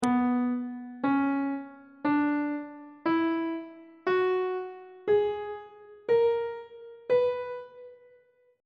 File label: c flat melodic minor scale note positions; on treble clef